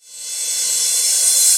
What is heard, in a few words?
Reverse Cymbal; Cymbals; Vengeance Samples